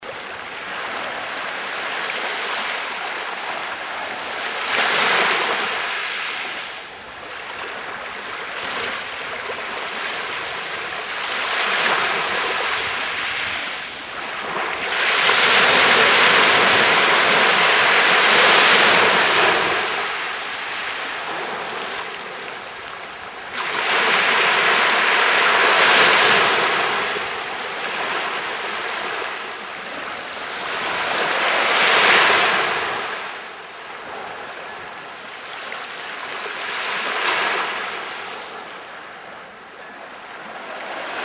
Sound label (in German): Strand